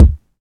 good steel kick drum sound d key, kick one shot tuned to the D note